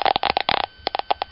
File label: geiger; factorio; UnrealisticReactors